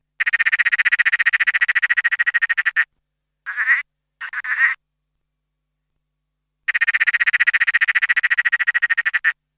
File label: Litoria peronii (Peron's Tree Frog or Emerald-spotted Tre